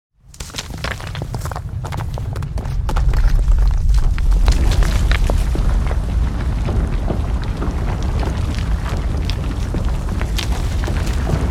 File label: Earthquake building collapse debris large rock destruction